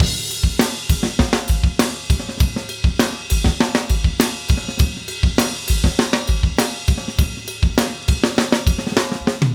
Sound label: 4 bar fill loop